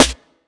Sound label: Knocks, Snares